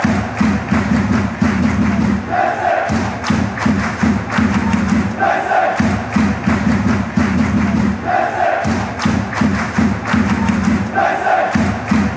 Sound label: racing klap, chants